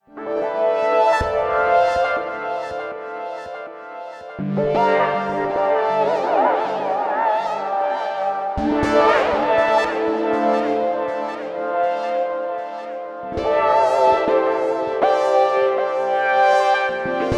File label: Oldschool